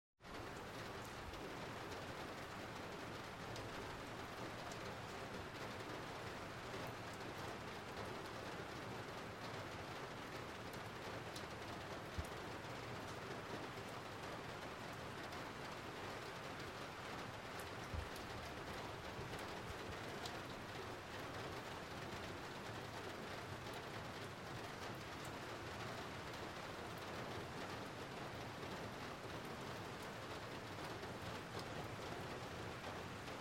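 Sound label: medium rain outside the window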